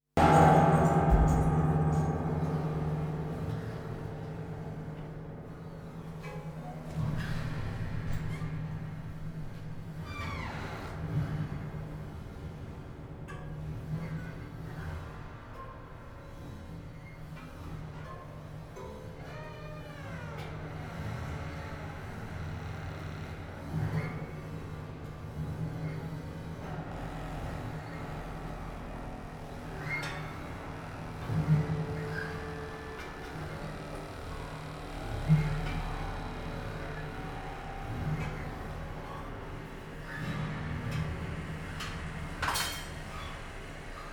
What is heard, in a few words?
Soundscape